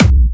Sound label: Clubby Kicks, Bassdrums